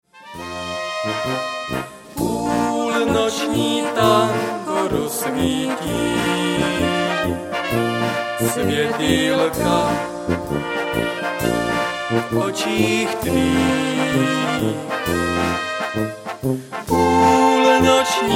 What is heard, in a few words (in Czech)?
Půlnoční tango